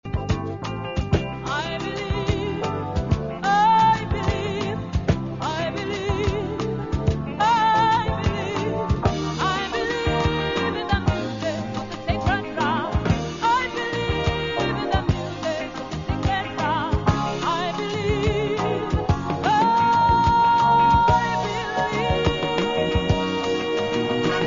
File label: Sacred drum